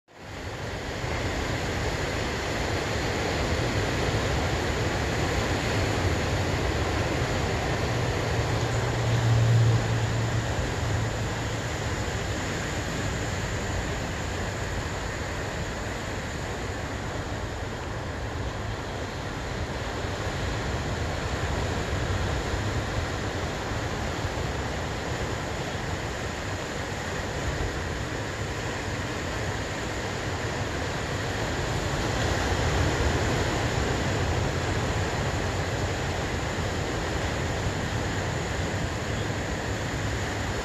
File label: White Noise; to Clear the Mind